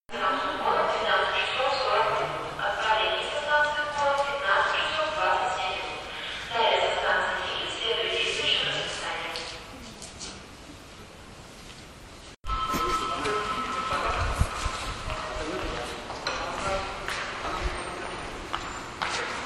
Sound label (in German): Bahnhof, Murmansk; Soundmap Murmansk 2012